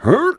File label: Workout